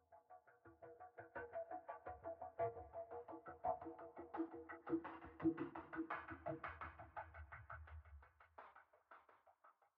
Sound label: Basic Low Beat 85bpm; Legends of Pegasus; windows gamerip 2012